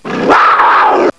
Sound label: wildcat